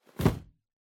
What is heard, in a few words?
Cardboard Box Put Down Stack; ダンボール; 置く 積む; 梱包材